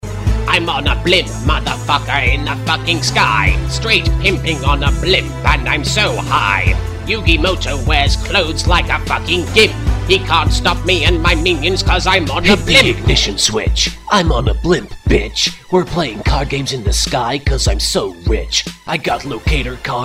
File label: Anime Song